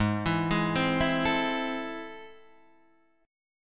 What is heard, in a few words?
A Minor Major 7th, G sharp